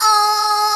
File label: wail loop; HeavenStudioPlus; gleeClub